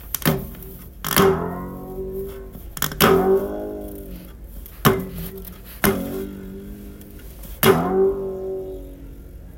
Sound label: Bass